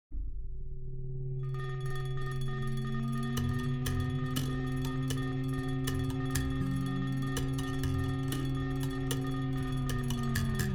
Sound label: EBow Kalimba; a crowded firehouse